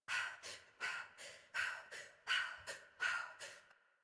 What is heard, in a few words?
Breath Heavy Fast, Adult, en; Warframe; New War; Adult Operator